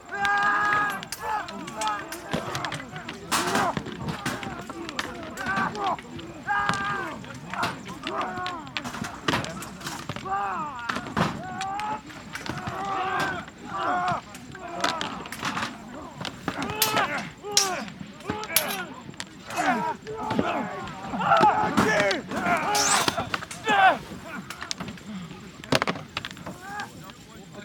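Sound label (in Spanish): Ambience Guerra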